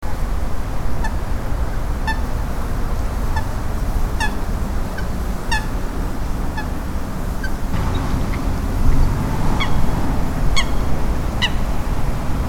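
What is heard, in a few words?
coot; oobann; unoke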